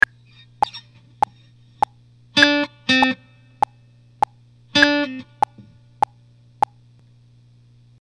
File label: pull off, guitar; beginner techniques